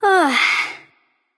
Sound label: sigh; discordia